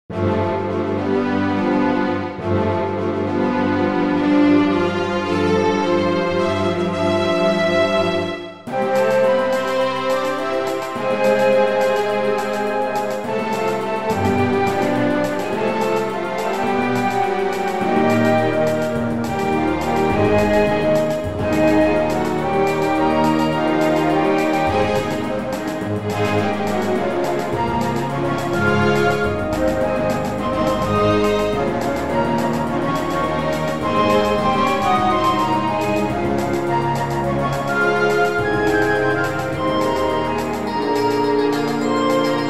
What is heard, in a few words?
orchestral